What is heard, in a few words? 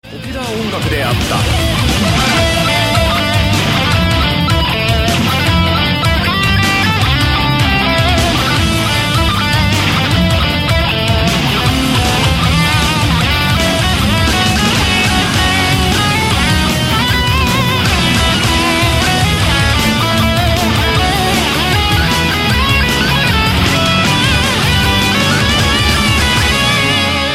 Play Guitar